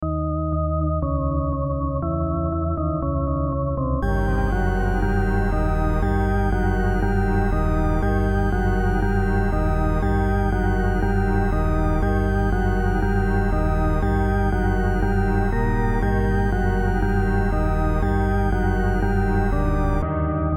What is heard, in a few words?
Eerie Song